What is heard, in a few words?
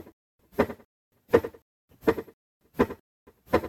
running; gemsters